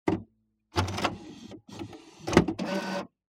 Technology Electronic Machine Fax Machine Turning On